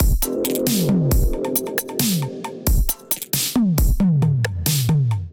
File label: Expo Kit Melodic 90 bpm, Drums; MIDI Clips; Drive and Glow; Ableton; Factory Packs